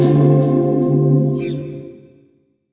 guit beauty chord, Ugly Remnants single shots; Sonic Foundry (Sony Creative Software); Ugly Remnants VOL-2

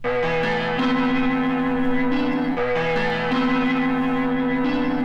Fake loop 95BPM; radiosonics

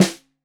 Snare 65 Reg Stereo, Deeply Sampled Drums

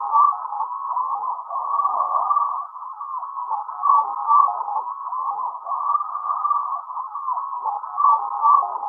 TUNING IN; Distored Reality

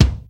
DBL KIK, Beatmachine; Hollywood Edge; The Big Bad Beatmachine

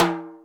Md Timbale, Alesis D4 drum machine

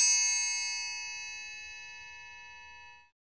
PRC TRIAN triangle, Perc; Northstar - Drumscapes Roland; Bonus Perc; Triangles

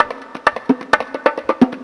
drum 130 perc, drumloops; Techno Trance Essentials

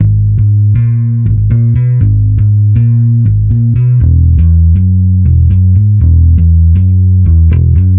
Bass Guitar 120BPM Abm; 99Sounds x Monosounds; Instrument Loops

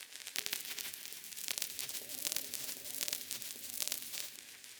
Cracklature, sparse soundscape

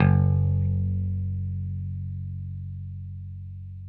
6STR BASS, Country; Sampleheads; Whole Lotta Country 1 & 2

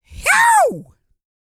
E YELL, MALE HUMAN; R&B & Dance; ILIO; Vocal Planet

